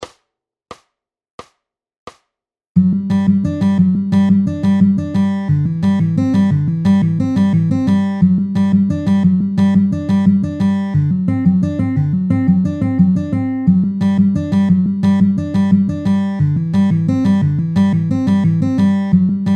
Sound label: rolls lick